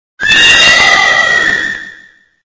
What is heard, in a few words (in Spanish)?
Grito de Hatterene